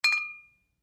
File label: Bottles Clinking